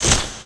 crow flap

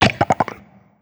chat; gonarch

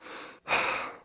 sprint breathe, police

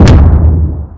underwater explode; Explosions

